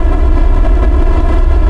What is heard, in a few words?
fast, ships engine; Quantum Redshift